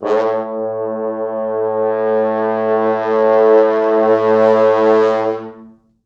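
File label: 4 French Horns, Horns; East West; Quantum Leap Horns Sax; 4 FrHorns MOD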